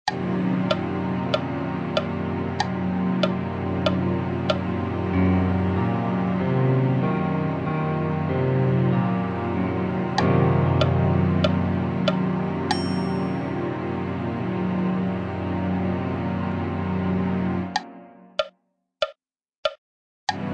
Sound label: min7b5 inv, ear training